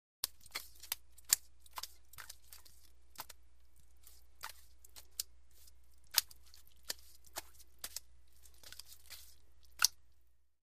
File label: Fishing Fish Flippi